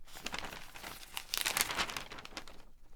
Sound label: Newspaper Page turn